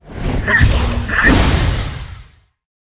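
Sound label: bee attack, monster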